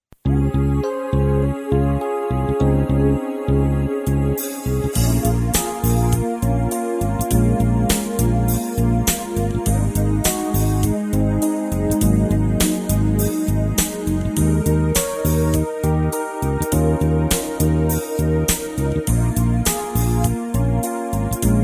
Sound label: Calm And Nice Ringtone